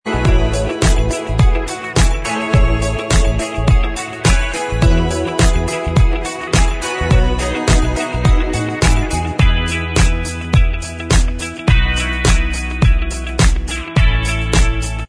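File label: Instrumental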